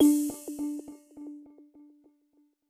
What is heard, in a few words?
Jingle Time Bonus; Super Rub 'a' Dub; PS3